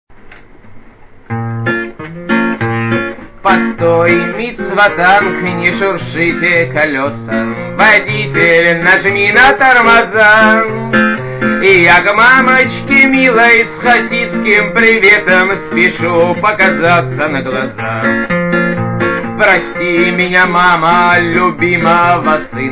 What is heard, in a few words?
song parovoz; rus